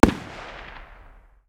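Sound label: light machine gun 762 medium distance; tacz-tweak-pack; taczmechanics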